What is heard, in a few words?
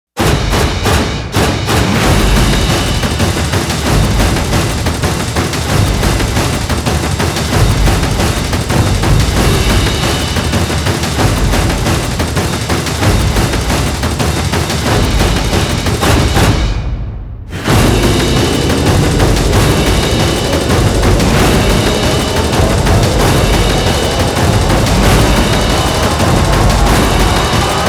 drums